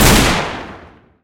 revolver; Weapons; EK modern; Barotrauma

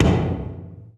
footstep; Barotrauma; Barotraumatic; xanHuskmutantarmored

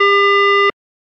warning small; Electricity; EK modern

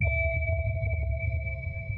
sonar Ping Suit Medium; Barotrauma; Real Sonar

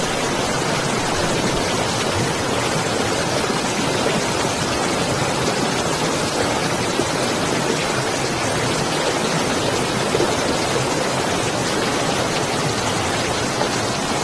Flow Large, Water; The Avali's Audio Overhaul

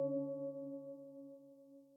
sonar Tail Air Close; Barotrauma; Real Sonar

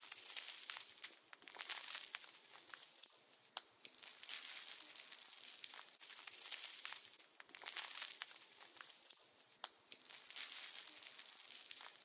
Smoking Cigarette Loop; Immersive Ignitables

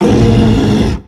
attack launch; Thalassophobia Restored; Humanapostle

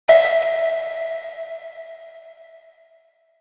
shell sonar beacon, weapons; EK modern; Barotrauma

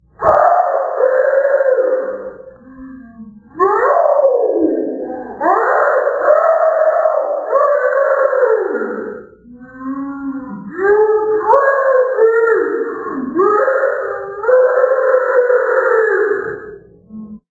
scream; Barotraumatic; Deepseaclownnew

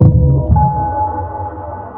sonar Ping Air Close Shuttle Directional; Barotrauma; Real Sonar

